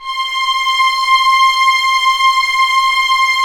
STR, String Master Series, Vlns 7 Orch

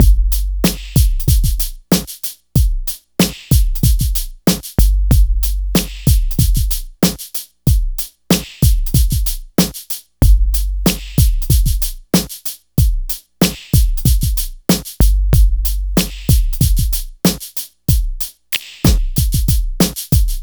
DRM, Hip Hop and R&B

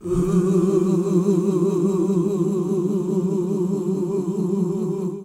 OOH F#2, GOSPEL; Best Service; Hallelujah; GOSPEL 10

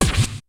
FUNK, DRUMS; Kid Nepro; Elite

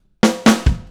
Drums Intro, Indie Rock; Sonic Foundry (Sony Creative Software); Indie Rock Anthems; Session 10 - Roswell

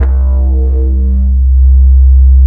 BASS, Drum & Bass, 170 BPM; Ueberschall; Drum & Bass Flash Trackz

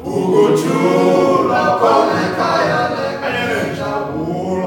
UGO CHU, CHOIRS; Spectrasonics; Heart of Africa

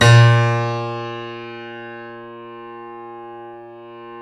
C7; East West; The Ultimate Piano Collection

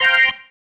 Stab One Shots, Organ

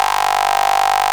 RAVE BEEP; Best Service Alpha Dance vol.2

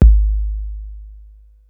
KICK, Hip Hop

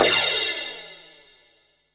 crash R; Zero-G; Chemical Beats; CRASH ZOO

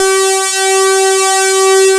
OC-NZ LD G3, SYNTHESIZER

